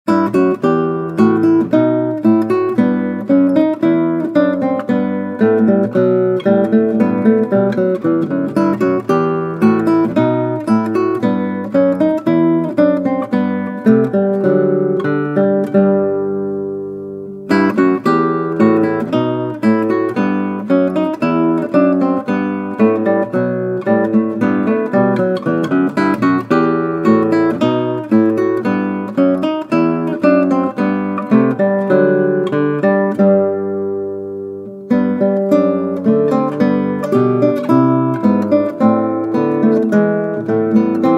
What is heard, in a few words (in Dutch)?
Klassieke Gitaar; Bach 50 Solos